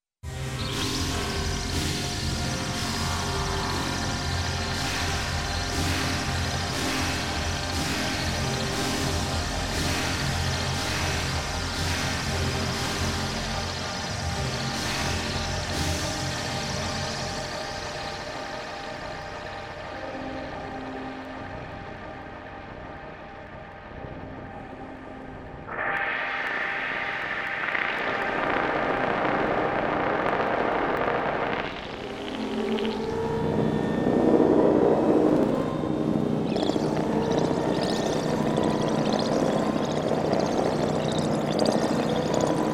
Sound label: birds